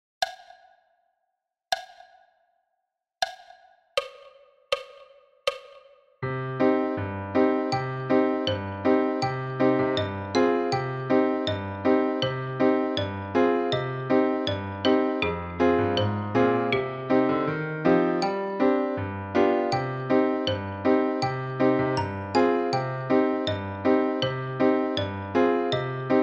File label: tutti a 80 bpm, percu